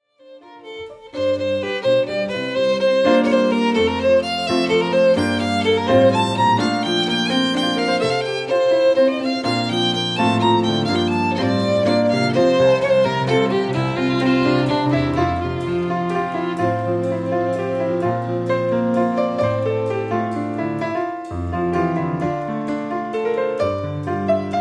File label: waltzes